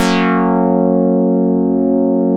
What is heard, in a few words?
BOLERO DR, Synth and Organ; InVision Interactive; Keith Emerson